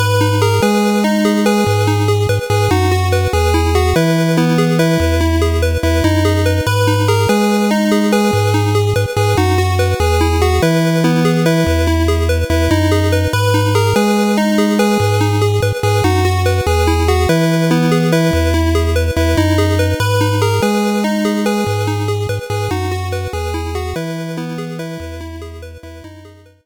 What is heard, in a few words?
Game Over; rockman forte; fc nes windows